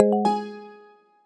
system notifications

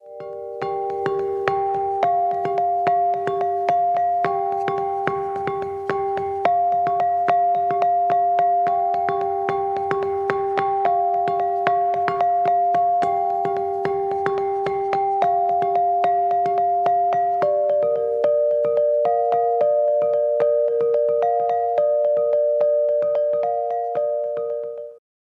ringtone bowl; Mudita Pure

